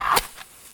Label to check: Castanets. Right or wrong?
Wrong